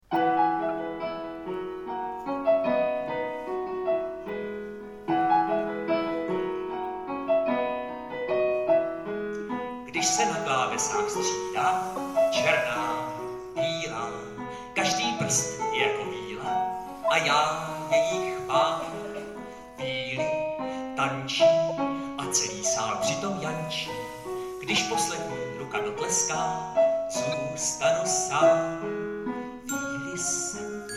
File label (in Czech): pianistova